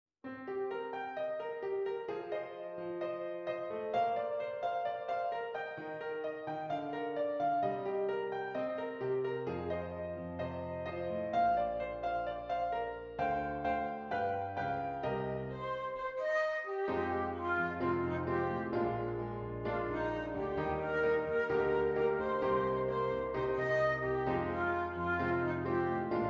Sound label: Flute and Piano